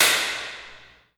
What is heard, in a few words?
empty apartment bedroom; phet io wrapper sonification